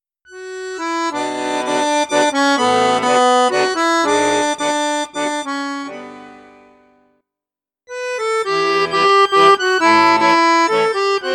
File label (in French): registre basson piccolo, accordeon; cavagnolo virtuose 4